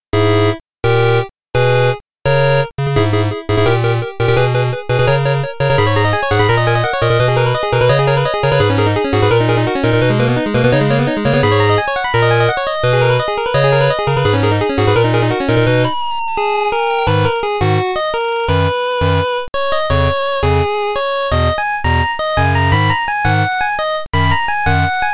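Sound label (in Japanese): バトル！（8bit）; Touhou Puppet Dance Performance Shard of Dreams; 風見幽香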